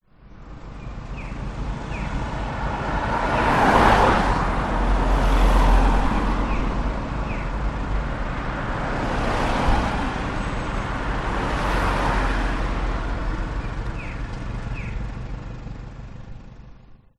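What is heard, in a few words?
traffic cars pass by car idles in background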